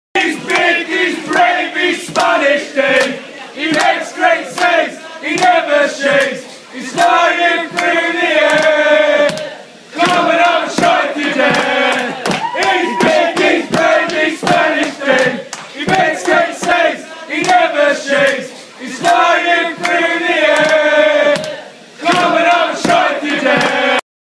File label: DAVID DE GEA CHANT